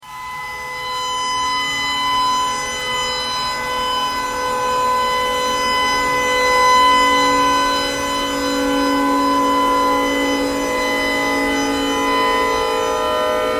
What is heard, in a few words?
tornado siren tornado siren thread noise